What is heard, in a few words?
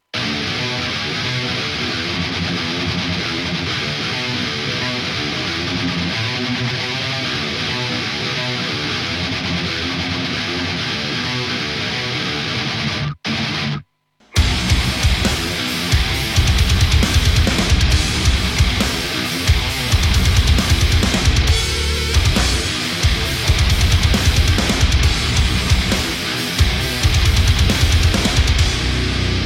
drum mix